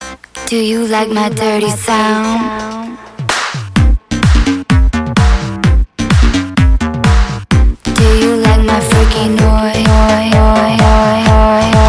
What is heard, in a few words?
Dirty Sound